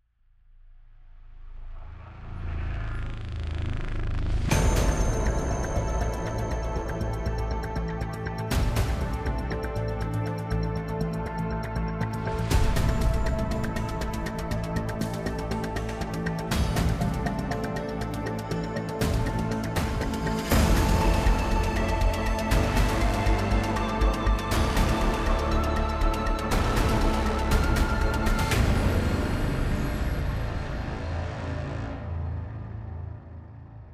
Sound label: Character Select; Ballistic